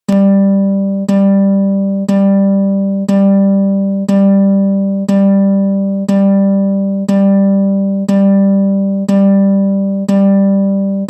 G3 Ukulele Bass

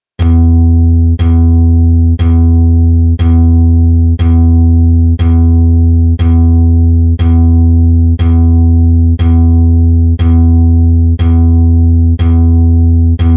E2 Ukulele Bass

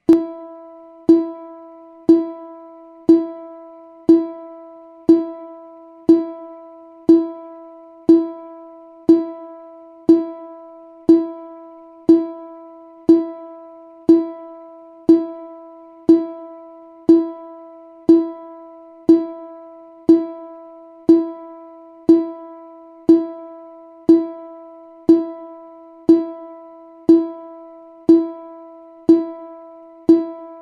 E4 Ukulele

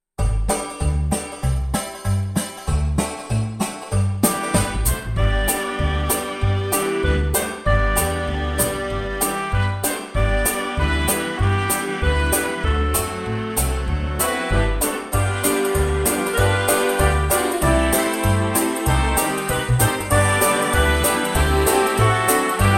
Oh when the Saints

